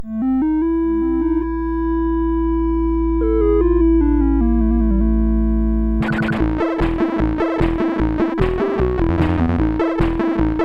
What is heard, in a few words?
sid, CSG8580R5; Turbans vs Cardans